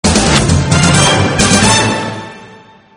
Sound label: Music Win